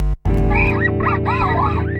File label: Guitar Effects and Noise; Guitar and Bass Effects; Ableton; Factory Packs